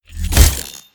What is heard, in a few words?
geometric gun